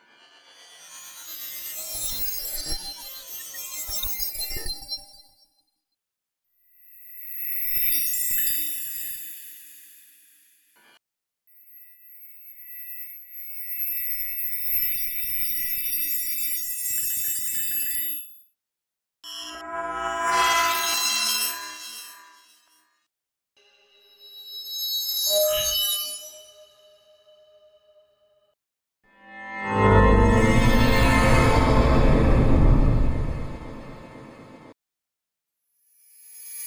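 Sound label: Magic Spells Bells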